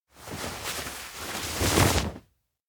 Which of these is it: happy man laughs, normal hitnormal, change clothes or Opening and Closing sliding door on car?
change clothes